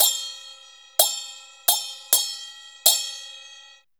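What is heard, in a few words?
CHINA SM12 R, China cymbal; Spectrasonics - Heart of Asia CD2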